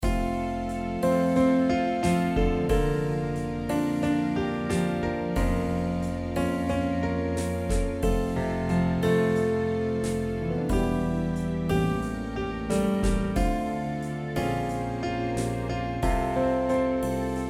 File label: Melancholy